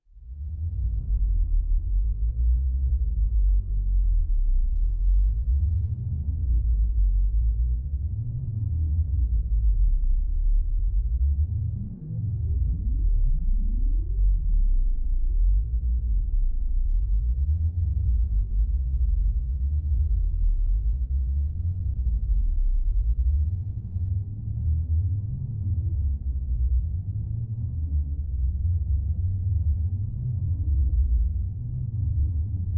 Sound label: particles low end rumble engine drops; sonniss gdc